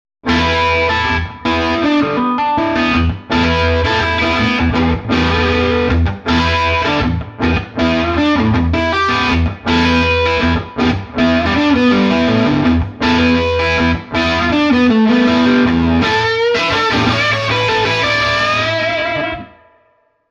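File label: SG Swamp, Swart Super Space Tone 30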